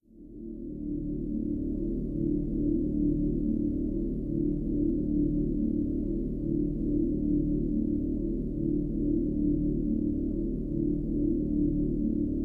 Drone; Assassin's Creed 2

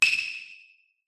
tick, switch; Mystical Ninja Starring Goemon

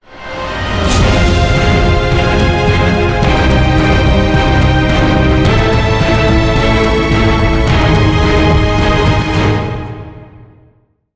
victory; call of duty modern warfare 2

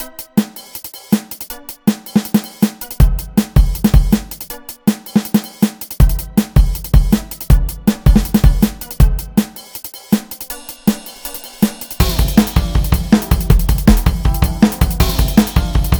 Instrumental; Friday Night Funkin' Internet's Down PC gamerip

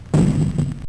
Boom; mine sweeper gba homebrew gamerip

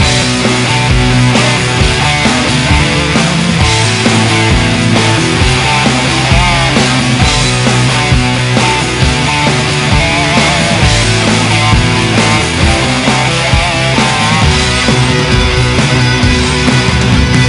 Music Rock; Learn to Fly 3

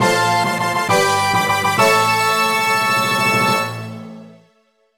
Jingle Clear Gold; let's draw; nds

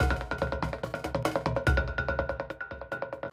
Percu buildup; Sifu; Music Hideout2

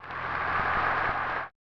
Applause

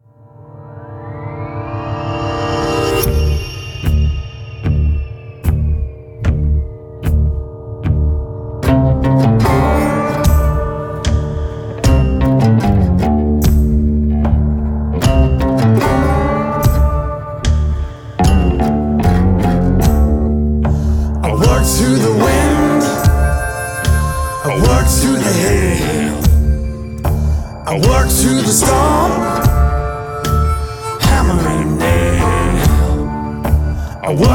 Country; Farming Simulator 19

